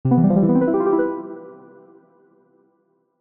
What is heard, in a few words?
tone; Valentines; seasonal